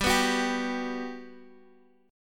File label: G Major 7th, Guitar, Open G; down